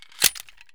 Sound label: grach mag in; Escape from Tarkov